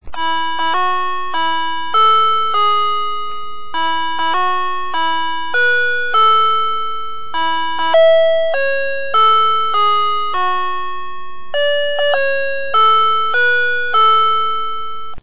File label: chime, quartz clock